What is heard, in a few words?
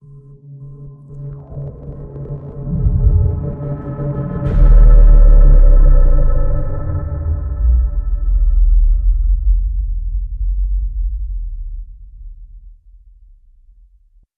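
Sub Fltr, sub filter; Empty Vault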